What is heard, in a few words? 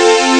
chord; miami chord; Amiga Soundtracker Sample Packs